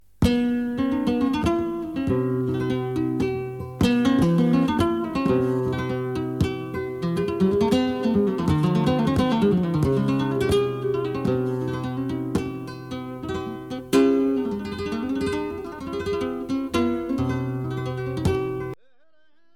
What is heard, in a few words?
Solea